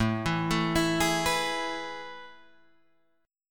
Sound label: A Major 7th Suspended 2nd, Guitar, Drop D